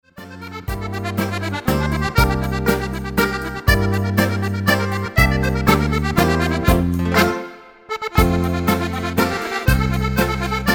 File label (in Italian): grande mazurca